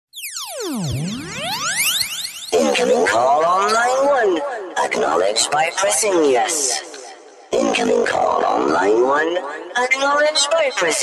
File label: galactic call ringtone